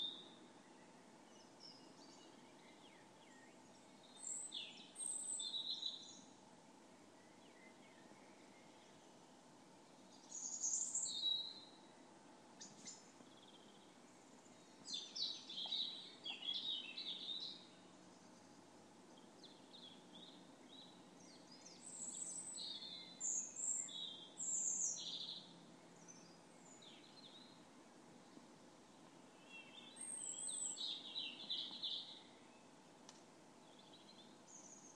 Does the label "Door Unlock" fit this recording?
No